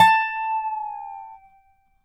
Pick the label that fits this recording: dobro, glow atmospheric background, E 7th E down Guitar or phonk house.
dobro